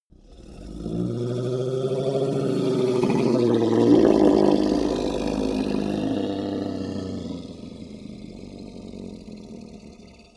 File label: hellhound roar growl long; kreaturen